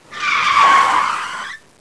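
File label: auto skid, derrapadas; cidade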